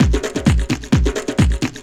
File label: drum 130 tribal, drumloops